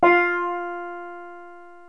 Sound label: sitar